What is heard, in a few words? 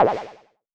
shoot